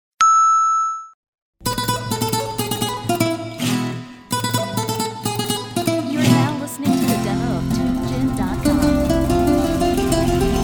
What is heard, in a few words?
Flamenco